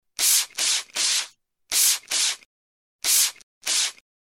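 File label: spray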